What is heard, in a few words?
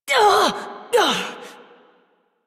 Pain Adult Operator; Warframe